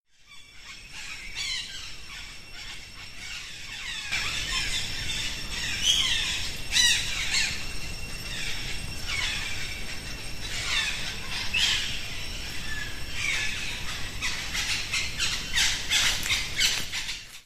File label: ta phrom temple birds sound